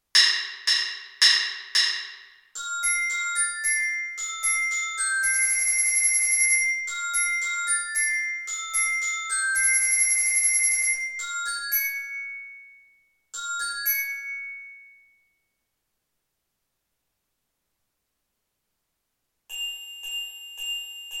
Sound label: orchestra bells only